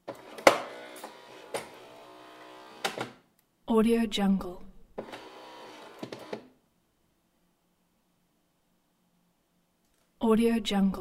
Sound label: coffee machine working